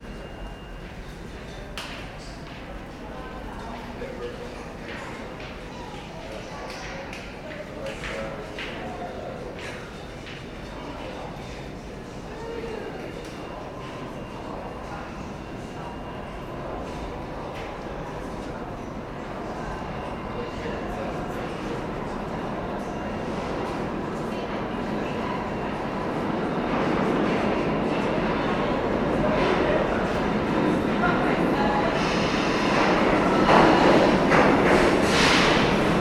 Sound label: ambience train station subway nyc trains people; sound effects one